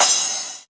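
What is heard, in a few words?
909 cymbal; Goldbaby Tape808 & Tape909; The Tape 909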